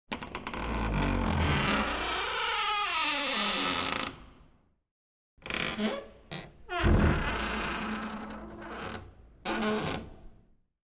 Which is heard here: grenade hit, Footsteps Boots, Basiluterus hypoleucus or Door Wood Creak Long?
Door Wood Creak Long